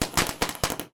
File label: tone; Fourth; seasonal